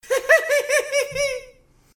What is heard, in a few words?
laugh